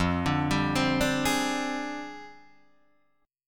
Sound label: F Minor 6th Add 9th F down Guitar Open D